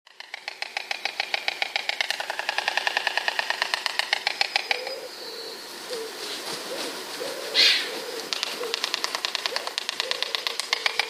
bociany

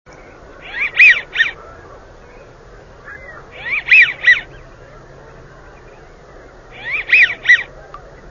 Świstun Amerykański - Anas Americana; wroblowe; anseri; anatini

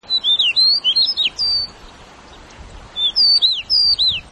kląskawka - śpiew; wroblowe; turdidae

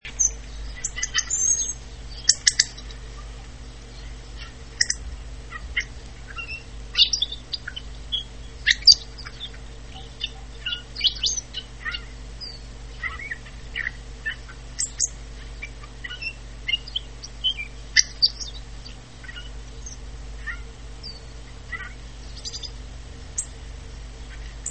grubodziób - śpiew

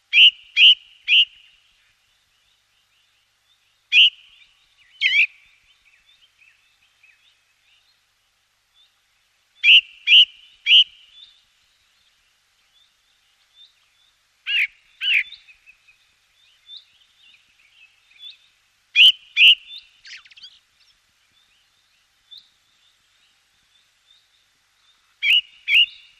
drozd obrożny - dłuższy występ; wroblowe; turdidae

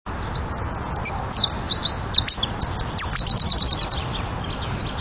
strzyżyk - śpiew; wroblowe

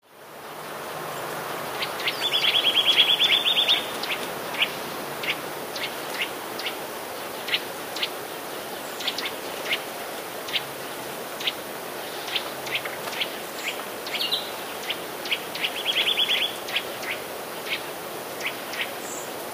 kowalik tryle, śpiew kowalika; 14 grudnia 2018 roku. Tryle na tym nagraniu mają wolniejsze tempo

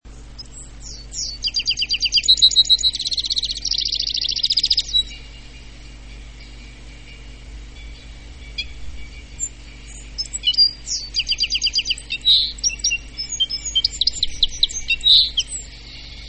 osetnik - śpiew

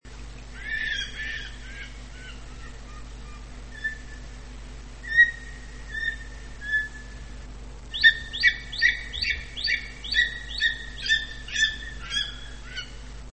orlik grubodzioby - inne głosy

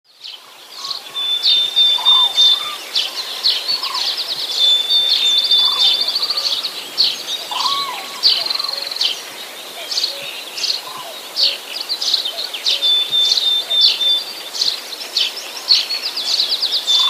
czarnoglowka, głos prawdziwej czarnogłówki; Na nagraniu prawdziwej sikory czarnogłówka śpiewa wyżej niż łozówka.; wroblowe; zapozyczenia

